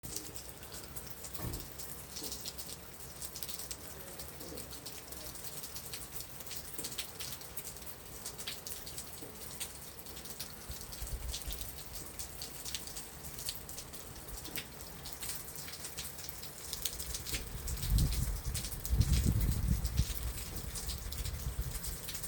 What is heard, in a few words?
rain